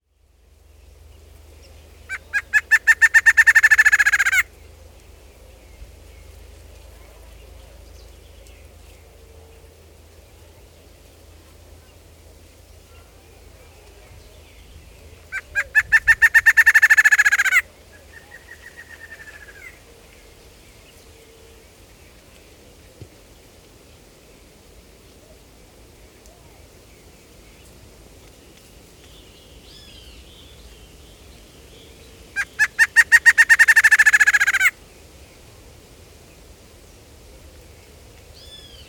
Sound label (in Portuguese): choca listrada